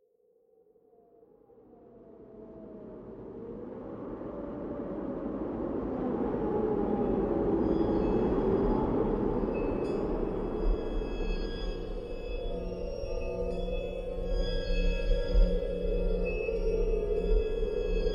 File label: Ambient